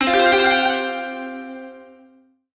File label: bigwin; DragonsLucky8WD; wazdan; ultrafast